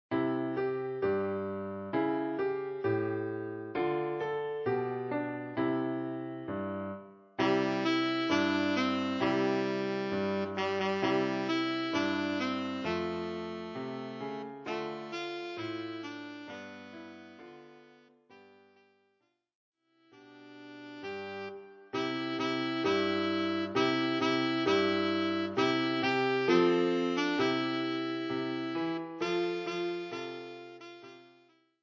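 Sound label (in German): Altsaxophon; Jingle Bells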